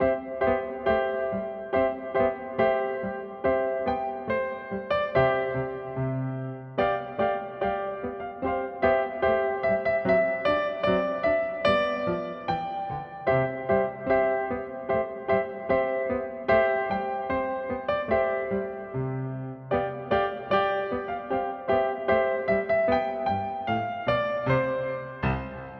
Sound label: Jingle Bells Duet